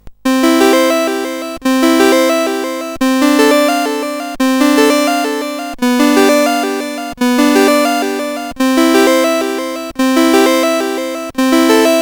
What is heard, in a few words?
Praeludium, CSG8580R5